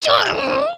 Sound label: Charge Grunt; emotes